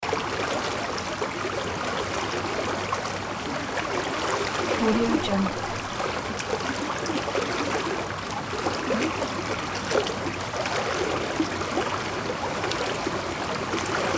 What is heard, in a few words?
forest creek loop